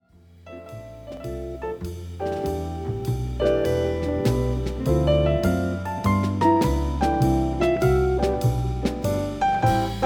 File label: gx-r65cx jazz dbx; fox jazz